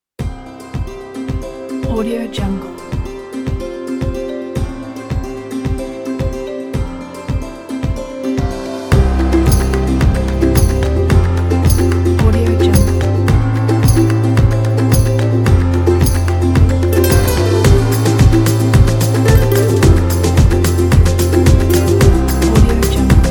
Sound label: summer folk holidays; ufound